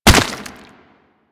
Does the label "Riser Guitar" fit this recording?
No